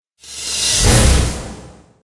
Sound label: build to smash